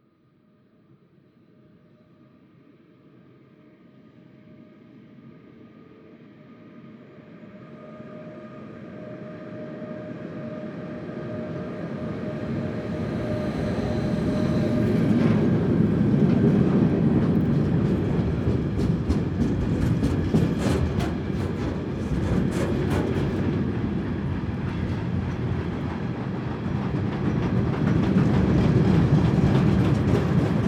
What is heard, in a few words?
train freight drive by slow